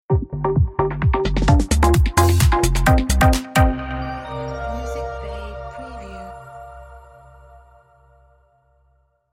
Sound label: Hi Tech House Ident